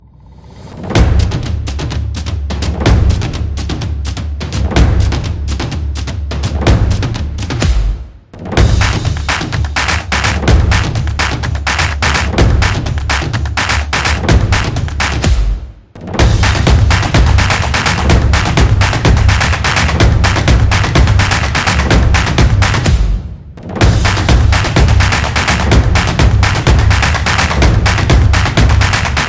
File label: The Drumming Beat, stomps and claps